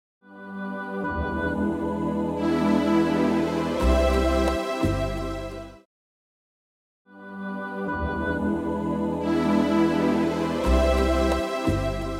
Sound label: Glissando